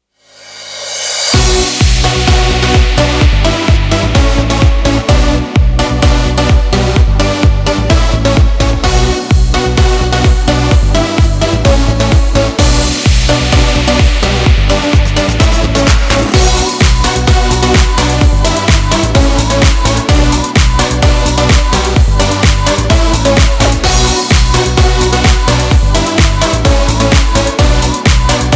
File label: house electronic techno; By Burak Sound Bank; house electronic techno vol 7